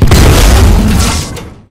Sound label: shot; poppy; prototype 1